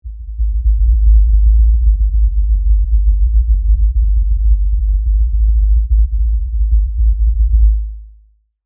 Tremor Long LFE, Scifi, Tremor, Long, Lfe, Rumble, Science, Fiction; BlastWave